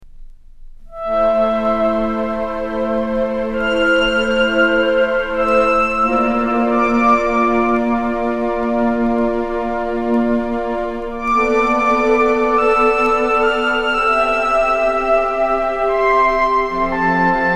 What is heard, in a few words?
elegiac tune over background strings bridge